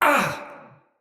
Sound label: Pain, Battle; Mendicant Wazard